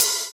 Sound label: Open Hhat, Hihat; Equipped Music; Loungin House